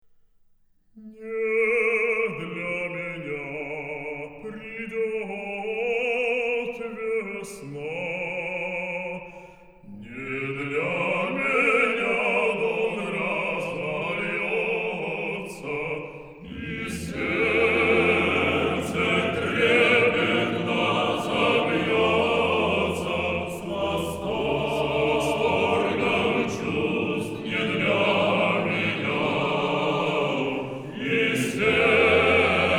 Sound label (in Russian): Хор